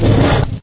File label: tentacle alert; source engine; hl1; video games